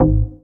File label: bass wonky donk G